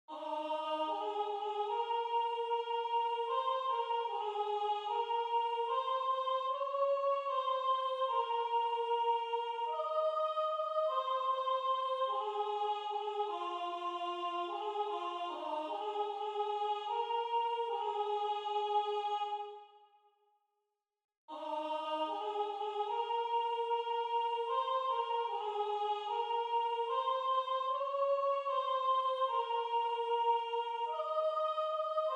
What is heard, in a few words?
Soprano, choir; O For A Thousand Tongues